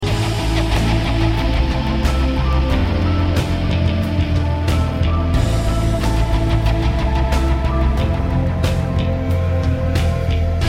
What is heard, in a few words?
menu music basic